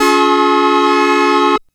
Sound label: C Major Analog one shot, Synths; Sonic Foundry (Sony Creative Software); Electronic Point Blank